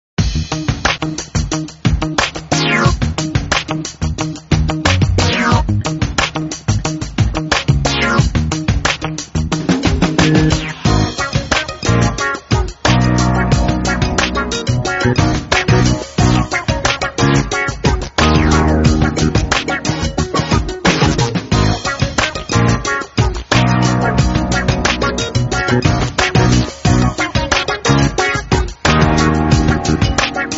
Nokia Inject, Ringtone

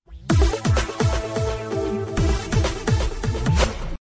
Samsung Galaxy S 3 SMS

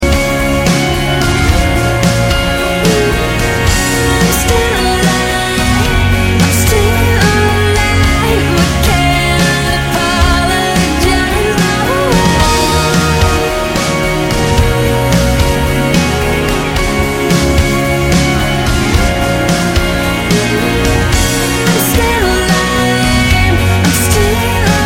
ringtone; Mirror's Edge

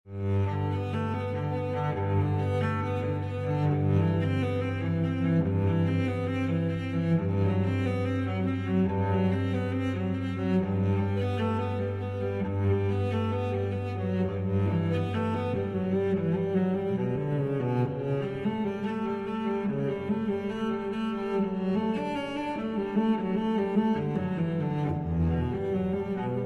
LG Cello Suite no1, Ringtone

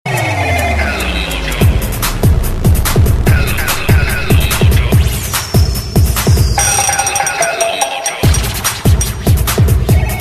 Motorola Mix, Ringtone

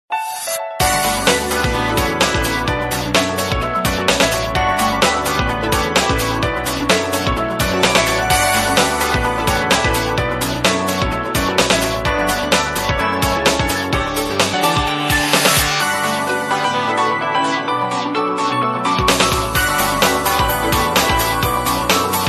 LG Roadster, Ringtone

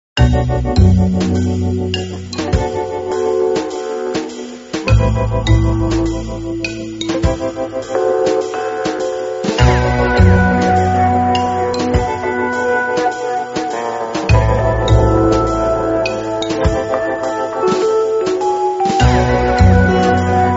Nokia, Ringtone

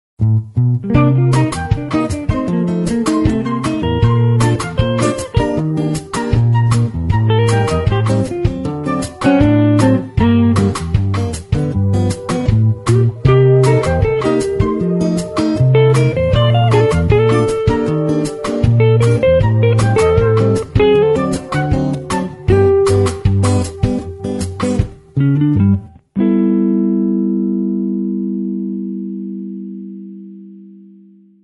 Nokia, Ringtone

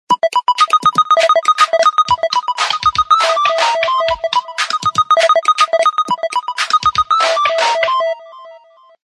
LG Robot, Ringtone

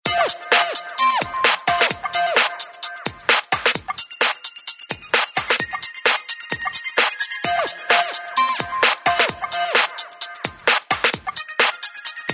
Electro, Ringtone; Htc

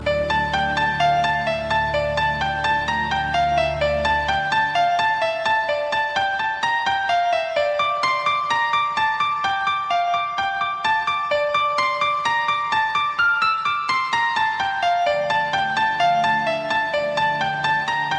Piano, Ringtone

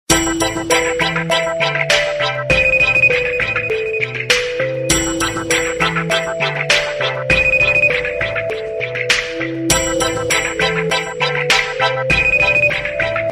LG Crystal, Ringtone

